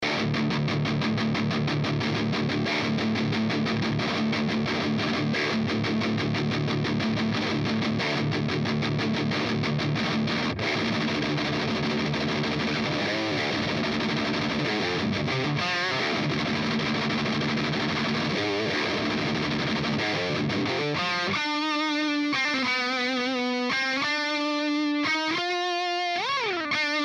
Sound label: DiMarzio Imperium F JCM800